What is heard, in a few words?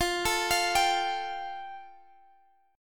F Major Add 9th, Guitar, Standard; arpeggiated; JGuitar